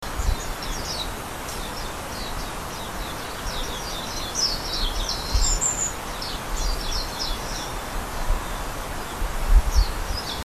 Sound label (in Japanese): mahiwa, bird; 忠魂碑と神社の間の遊歩道で、群の 鳴き声; 数十羽で群を作り; 蘭梅山; 野鳥